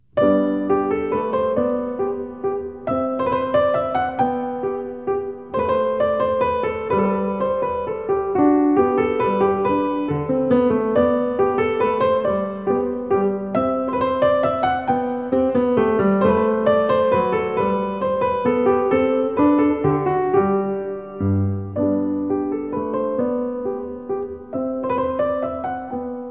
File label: minuet in g major